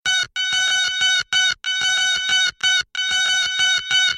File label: Sms Tring